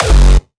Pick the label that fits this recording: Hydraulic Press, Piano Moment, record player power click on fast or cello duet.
Hydraulic Press